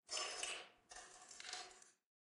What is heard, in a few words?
Mineshaft Steel Creak